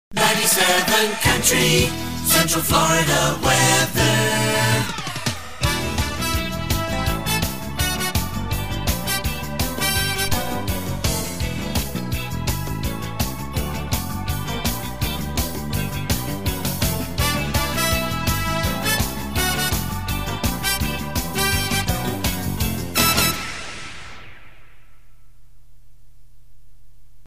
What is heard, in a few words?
jingle Central Florida weather; WPCV